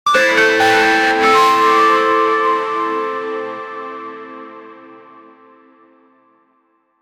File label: red alert in the camp, scifi